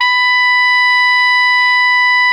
SOP FF B 4, Saxophones; Greytsounds; Philip Wolfe David Crozier's Saxophones

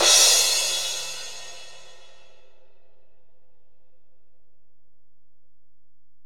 Ride, Alesis DM-PRO

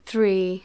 Female vocals and robo-vox

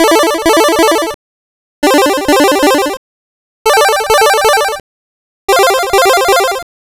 Game Boy Chord Loop, Nintendo Game Boy Synth

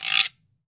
GUIRO

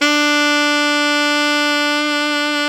sax alto ff; Alto 414

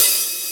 Lite Hat Open

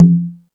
Latin Layers, Drum Machines E-MU Pro-Cussion

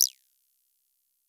303 saw HP 200; CMP - Analogon

